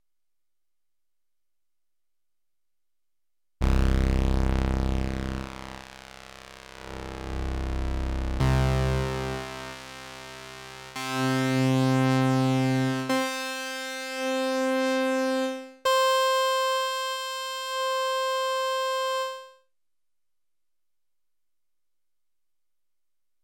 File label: highopass, Kawai sx240